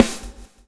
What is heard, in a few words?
swing